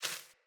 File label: Sparrow Quick Move; Animals